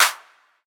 clap f sharp key, Royality free hand clap sample tuned to the F# note. Loudest frequency: 4053H; single hits one shots